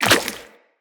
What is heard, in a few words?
Step Goo, Footsteps, Walk; Characters; Tenno